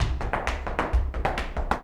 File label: Flamenco Tapping Zapateado Solo; Best Service ProSamples vol.46